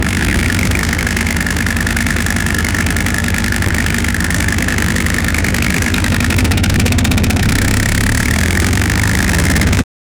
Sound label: formula 1 engine revving, motorsport